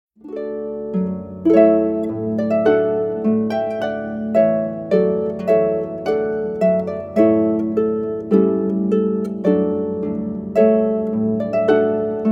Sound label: Trumpet Voluntary; recorded by Jonathan VanNest in Winchester, Virginia